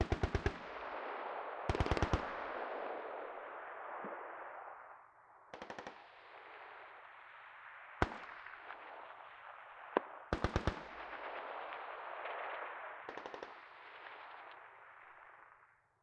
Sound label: combat atmosphere; World of Tanks